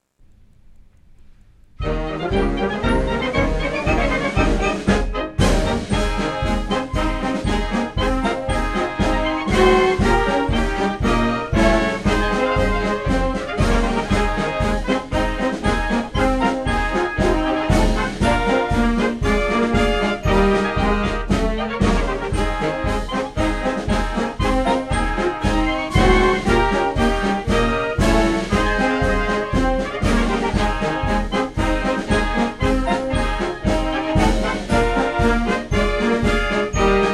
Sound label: Regimental March